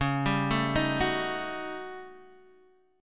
Eb 7th Sharp 9th Flat 5th, Db

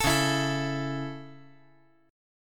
C sharp Minor Sharp 5th C sharp, chord; C#m#5 strummed; JGuitar

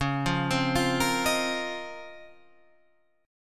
Db Major 13th; arpeggiated; JGuitar

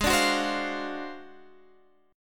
D 7th Sharp 9th Flat 5th Ab, chord

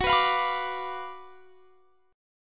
Gb Major 7th Suspended 4th Sharp 5th Gb, guitar

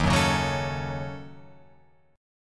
D sharp Minor 7th Flat 5th down Guitar Open F; strummed

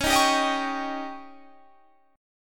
Db Suspended 2nd Flat 5th, Guitar Standard; strummed; JGuitar

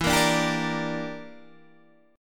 E Minor 7th Flat 5th E, Guitar DADGAD

